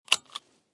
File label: Seatbelt Click